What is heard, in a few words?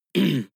harrumph male single short; soundpool